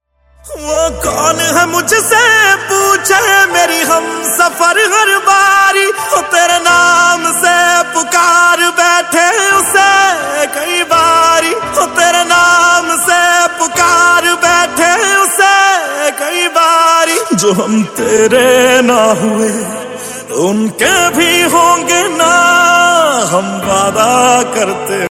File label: bollywood sad song ringtone